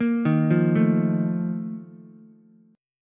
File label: A sharp Major 7th Suspended 4th, Ukulele; arpeggiated